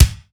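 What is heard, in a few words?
BD, drums; East West; Global Sounds; SPD11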